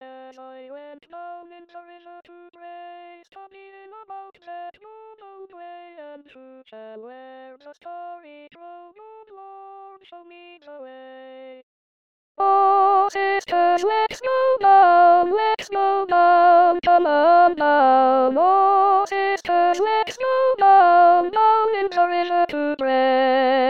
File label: SATB Sop